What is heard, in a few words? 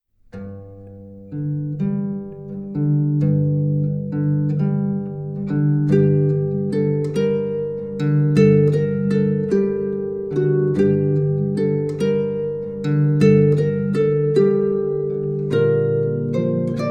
basque lullaby, harp